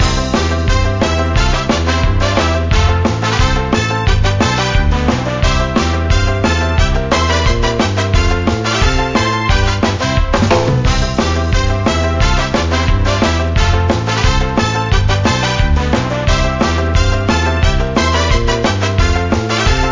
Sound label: bgm raid quest loop